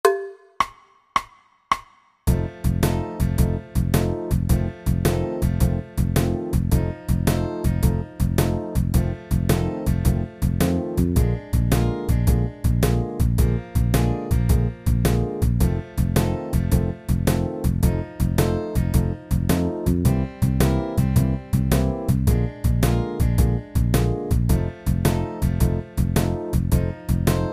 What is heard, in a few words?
Basic Blues in F Shuffle 108; 12 Bar Jazz Solo Project “Shuffle Style”